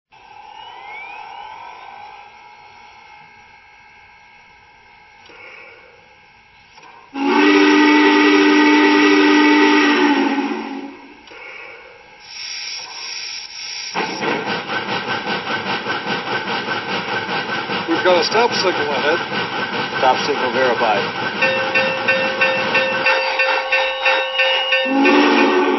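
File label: Challenger, coal train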